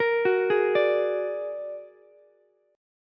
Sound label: Bb Augmented 7th Bb down Ukulele; Bb+7 arpeggiated; JGuitar